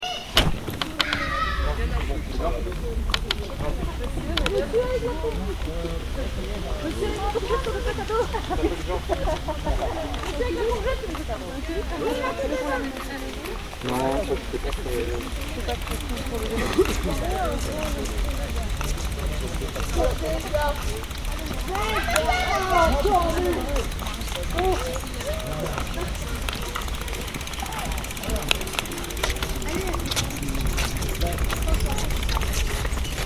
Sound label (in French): Ambiance de marche